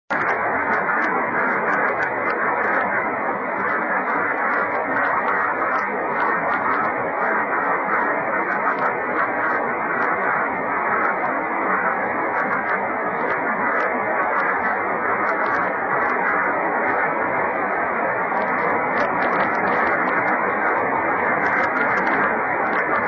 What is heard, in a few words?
24G beacon; 小雨、気温２７℃ 湿度７５％; SSB:RST519 レインスキャッタ; JH1UGF 横浜市; JN1AYV 茅ヶ崎市